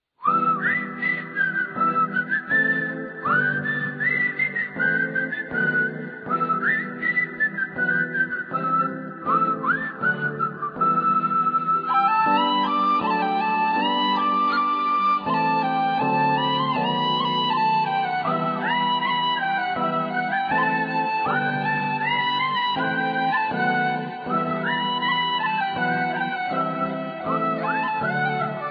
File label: flute music; classical